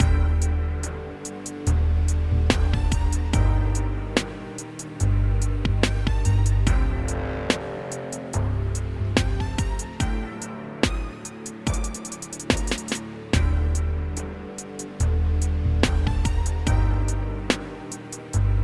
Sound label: slow trappy